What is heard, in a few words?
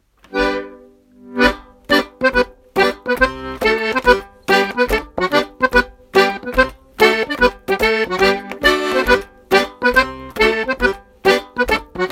Broom Dance tune